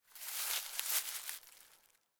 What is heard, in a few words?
Search In Grassy Places; LOOPERS